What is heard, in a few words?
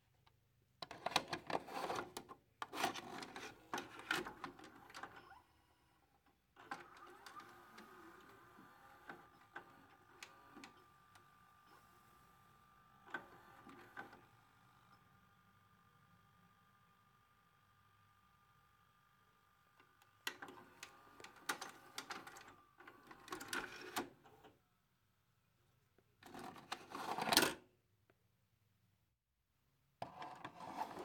Vhs Machine Inserting Tape